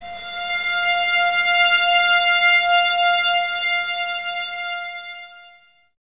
VS F 5, Violins; Miroslav Vitous; 11 Violins

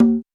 Roland U220 Dry Set

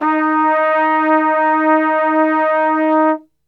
D#2, Brass; Best Service; Brass Super Section

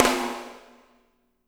SNR; Spectrasonics; Burning Grooves

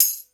Tamb, Drum Machines

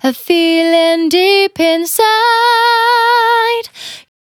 Finally 70bpm C, Velvet Vocal; Zero-G

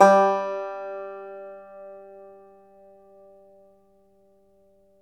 FLAT TOP, Flat Top Banjo

